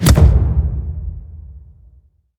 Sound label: ground stomp medium; raggified